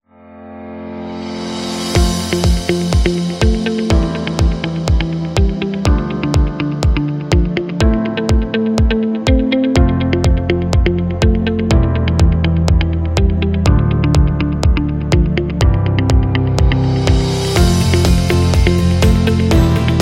The Corporate; service business

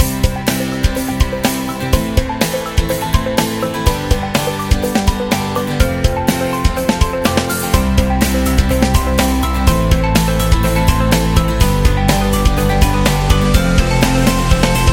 The Schooling Upbeat

